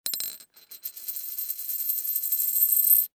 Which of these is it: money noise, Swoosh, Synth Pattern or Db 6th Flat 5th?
money noise